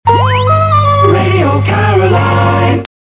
caroline jingle

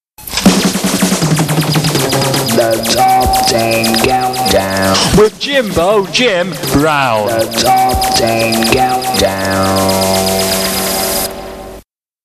Jingles

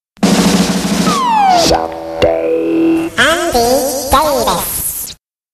Jingles

